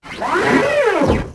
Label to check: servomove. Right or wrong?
Right